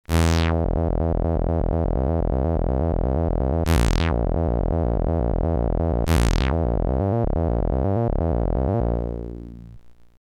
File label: bass filter, HardSID